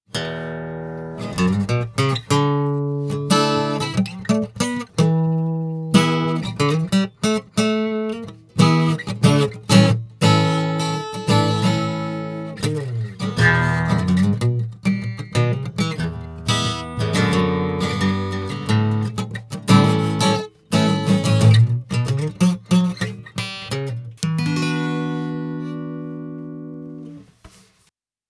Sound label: Guitar Neutral